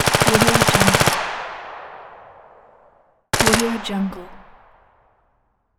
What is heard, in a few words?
m16 automatic rifle